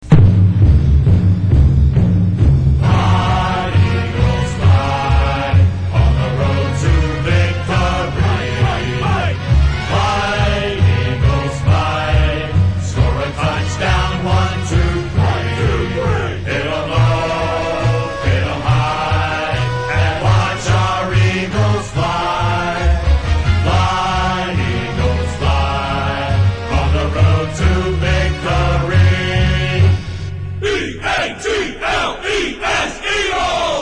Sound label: fightsong vocal